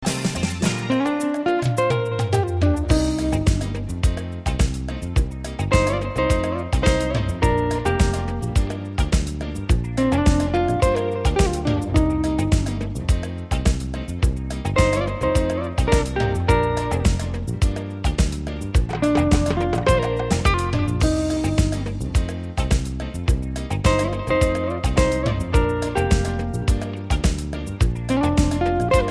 Latin Jazz

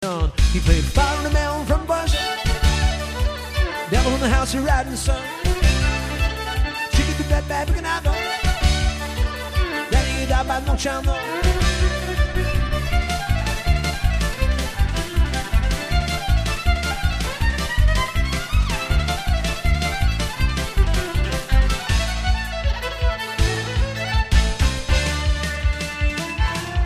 Fiddle

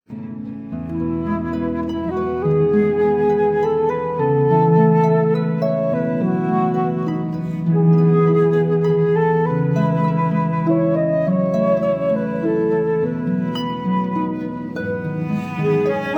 Harp

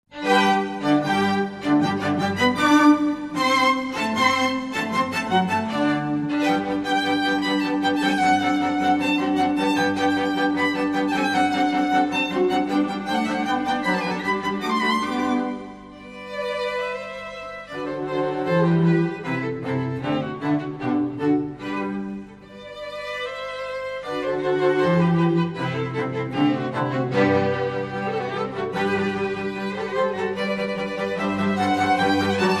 Classical

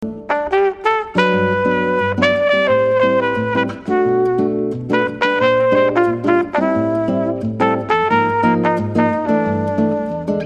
Brasilian duet

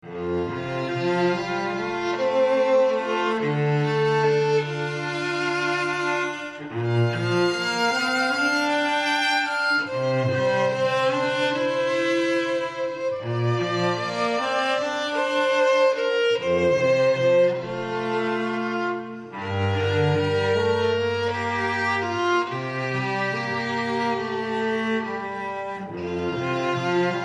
Strings

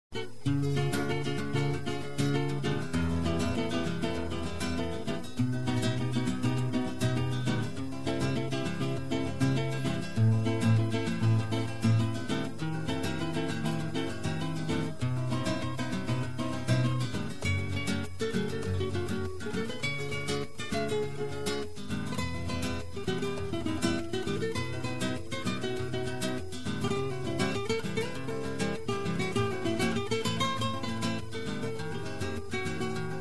flamenco

